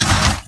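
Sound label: Stalker Movement Servo Heavy Short; Titanfall 2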